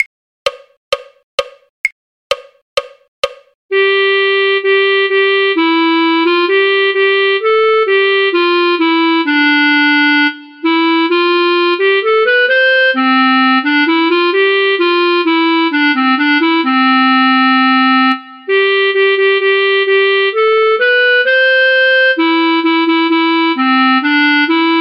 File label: clarinet; all in a garden green; folk